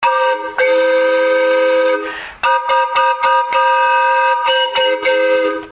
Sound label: train